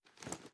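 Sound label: sniper reload cloth; gmod; viper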